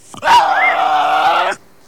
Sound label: BARK